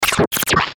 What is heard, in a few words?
GLITCH FAST, Glitch Fast Zips; Production Element Imaging Element Accent Transition